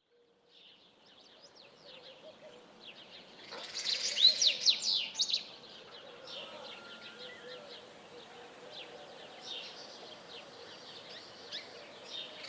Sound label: village early the morning birds and insects from a palm farm; fvtt sonniss gdc audio